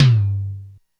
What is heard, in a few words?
LoTom, Korg DSS-1